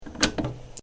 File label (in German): kueche schalter